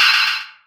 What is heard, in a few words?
shout; Pokemon Essentials; Pokemon Circon BETA 2 v1.8